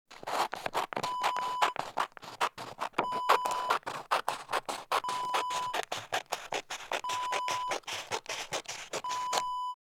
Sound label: scissors cutting